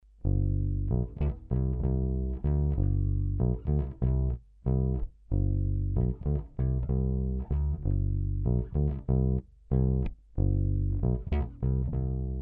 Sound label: 1992 Thunderbird, gibson bass